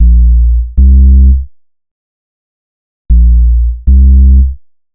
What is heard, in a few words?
Bass, Futurist Drum & Bass; Sonic Foundry (Sony Creative Software)